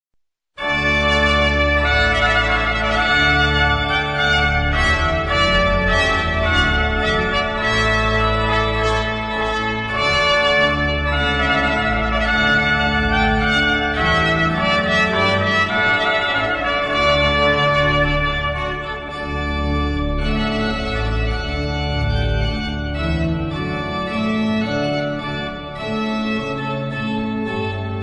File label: trumpet, organ; Jeremiah Clarke; Trumpet Voluntary